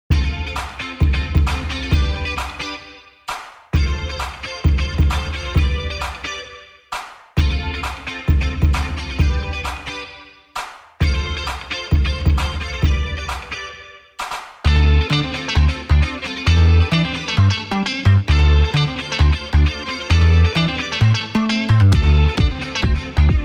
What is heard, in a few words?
Funk